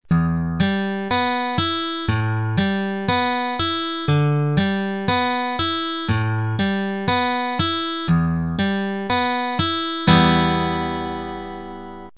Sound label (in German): Tonleiter